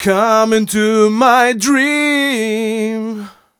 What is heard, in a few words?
m 127 mel a, Vocals